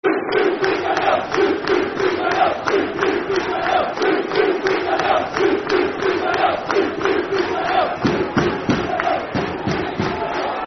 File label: Do, Do, Do Stand Up, Football Chant, Soccer Song; Shrewsbury Town Stfc